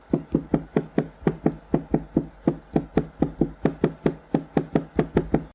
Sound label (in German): vibration tippen, Vibrationsmotoren im Smartphone; samsung galaxy s20 ultra; So groß ist der Unterschied zwischen Vibrationsmotoren im Smartphone